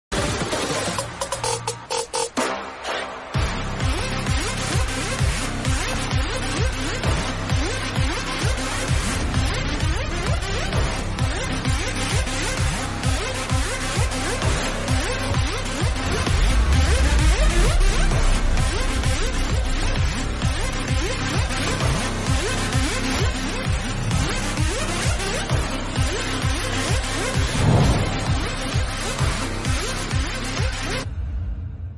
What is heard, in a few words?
bgm; pathinettam padi